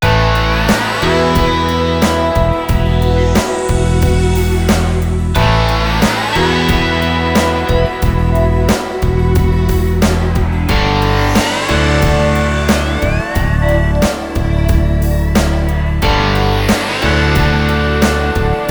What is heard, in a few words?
(Bridge), phonetones Nokia; PlusFourSix; Lars Edvall; Rooster